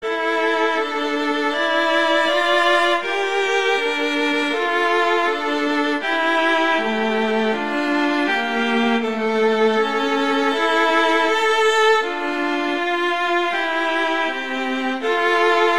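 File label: Duet Romanza, cello; Pleyel; Duets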